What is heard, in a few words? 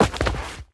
Bodyfall Dirt; SFX Kit; Bodyfalls